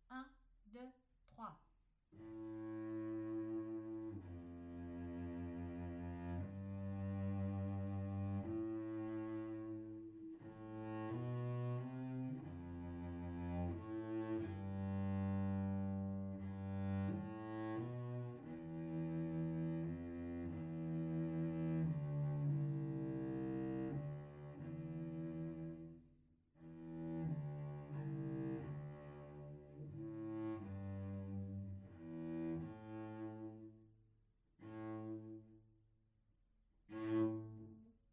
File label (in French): Violoncelle seul; Valse des Cordes